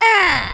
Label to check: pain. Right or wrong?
Right